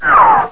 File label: zip